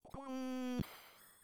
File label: pleasure moderate; VIVAE; vocaltrax